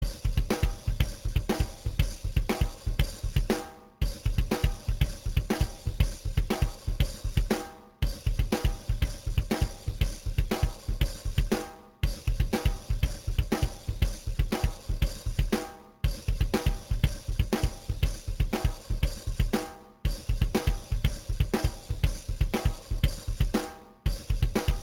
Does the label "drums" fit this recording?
Yes